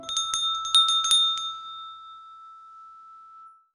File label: a short sparkling success